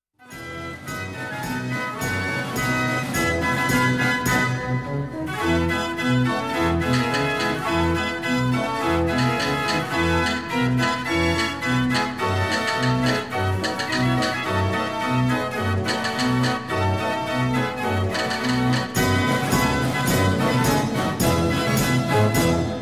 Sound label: de turk, draaiorgel; versierde bedstee